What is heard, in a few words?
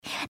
breath; pony; Twilight Sparkle